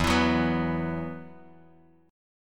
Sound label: E Suspended 2nd E, down, Bass Standard